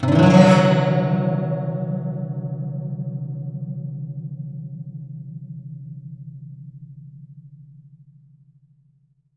GUCH GLS, GUCHIN; INIS - Opium; GUCHIN-GLS

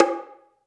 BONGO H.O; AKAI S-Series CD-ROM Sound Library VOL-5

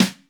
snare, dry standard snare

